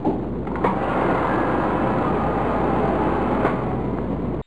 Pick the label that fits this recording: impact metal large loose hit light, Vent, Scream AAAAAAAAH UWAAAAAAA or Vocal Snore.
Vent